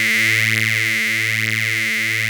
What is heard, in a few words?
OB A3, VOX, Synthesizer; Hollywood Edge - Giorgio Moroder Rare Synthesizer Collection; OB 4 VOX.